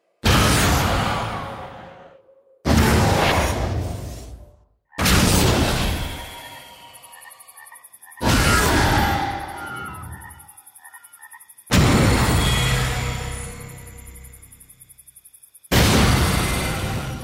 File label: Element Magic Hit